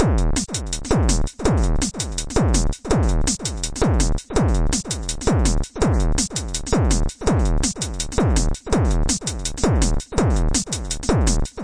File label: 165 echoey superzaps; 161 to 180 bpm